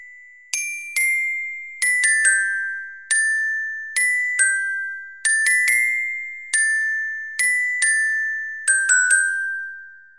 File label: melody to identify; What melody does this fragment belong to?